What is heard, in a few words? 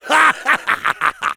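laugh happy, French; Rire joyeux 1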